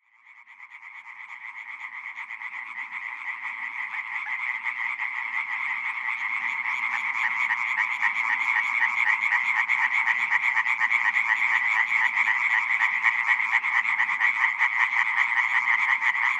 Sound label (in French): rainette verte